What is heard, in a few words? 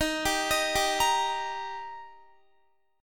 Eb Major Eb down Guitar 4F,4A,5C,5G,5C,6E; arpeggiated